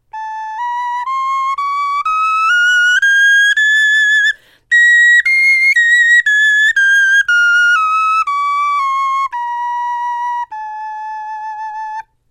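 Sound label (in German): tonleiter, Knochenflöten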